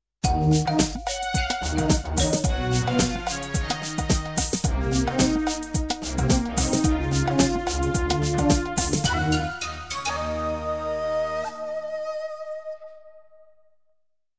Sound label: oriental